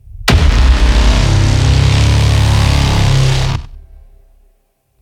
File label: Hit; Monitor